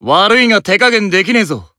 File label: Warcry